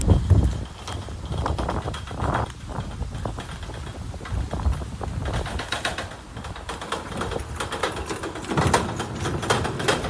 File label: trailer being towed; oakdown holiday park